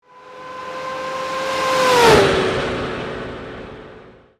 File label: 70s muscle car; Car SFX